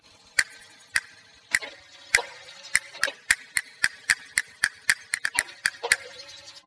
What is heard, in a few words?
Castanets Violin